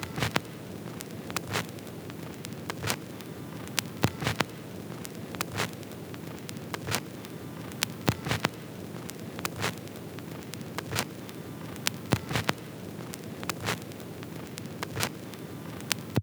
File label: Vinyl Noise; FX; Cymatics; Eternity Sample Pack